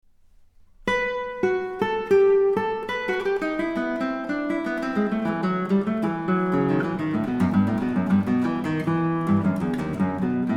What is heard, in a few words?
sample guitar